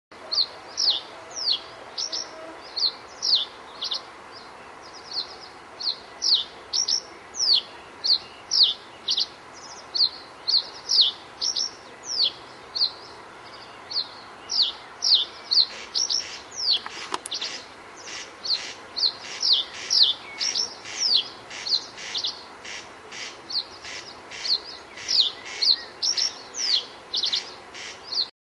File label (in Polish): potrzos; zakole wawerskie